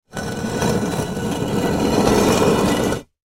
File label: Rock Slate Movement, Rock Slate Movement Slide; Cinder Block Long; Materials; Foley; Blastwave